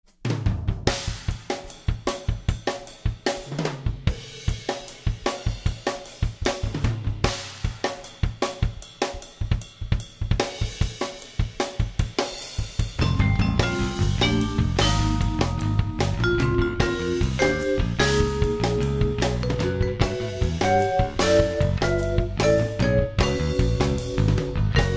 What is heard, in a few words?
Fanfare